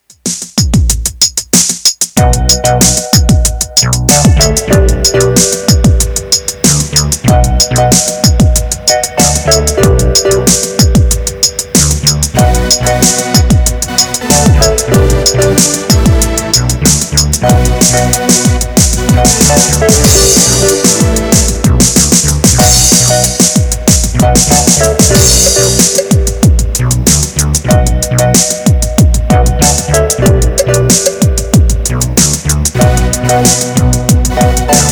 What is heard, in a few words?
Tekno; Behringer 646